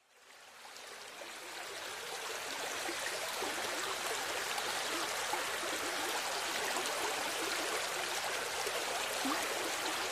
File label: Running River Sound Effect